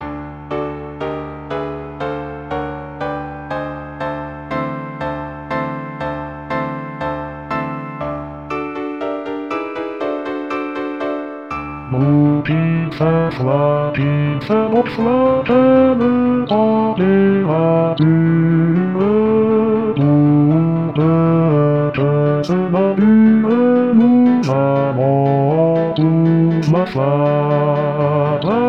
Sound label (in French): Brrou qu'il fait froid Basse; BANES